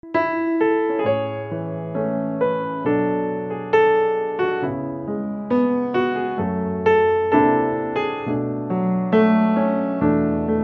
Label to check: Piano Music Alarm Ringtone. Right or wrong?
Right